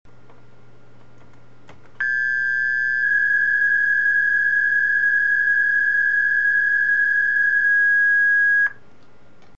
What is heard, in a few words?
psk125